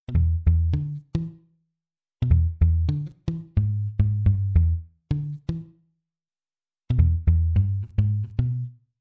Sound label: Funk Gtr Muted